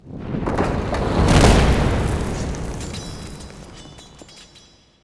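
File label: twilight invasion ambience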